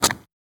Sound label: run gravel